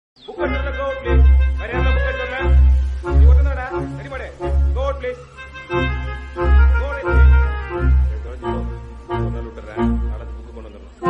flute ringtone; moonu movie; tuition; three movie bgm

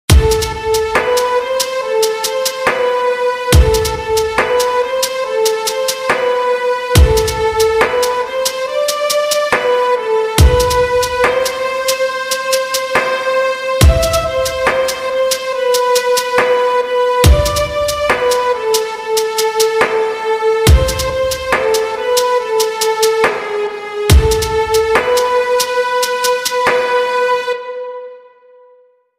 piano instruments piano ringtone; bgm

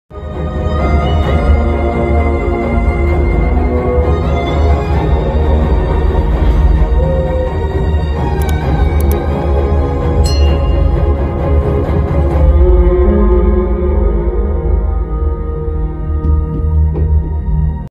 death sad bgm movie ringtone bgm; hanuman

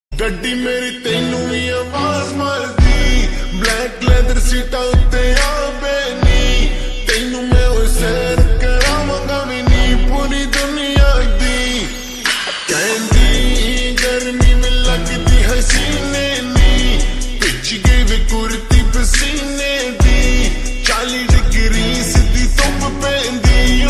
new hindi ringtone

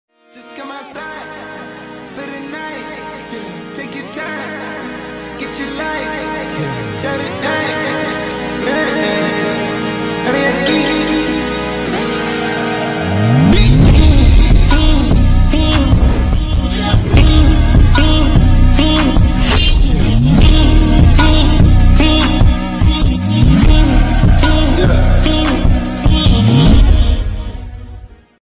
phonk ringtone

